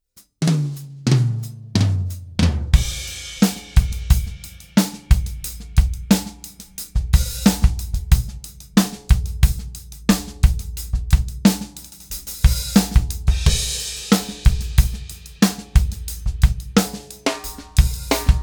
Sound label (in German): OH symm. Snare ohne Aligning Vergleich